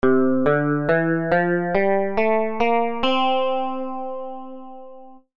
mode do mixolydien

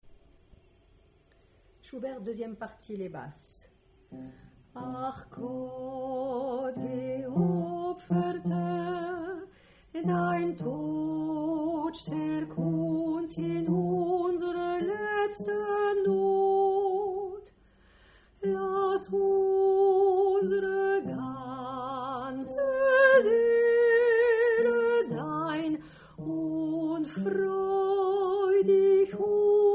Basse; schubert

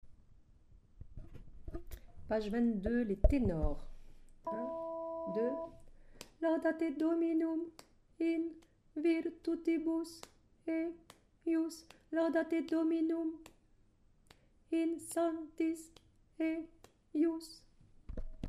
psaume Tenor

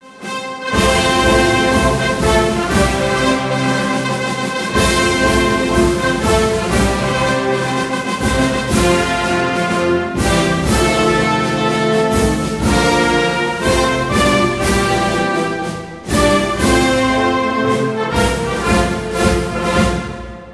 Marseillaise instrumental refrain

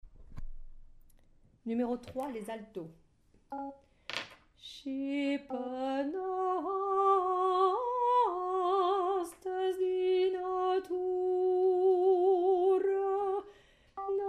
Alto; pop

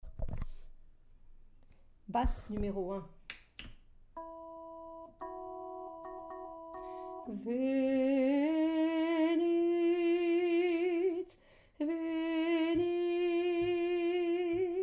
Basse; pop

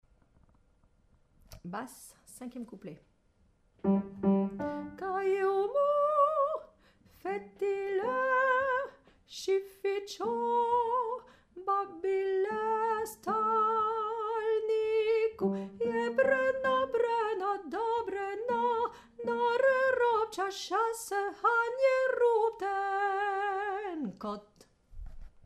Basse

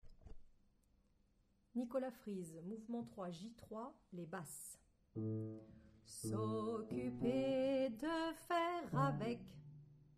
Hommes

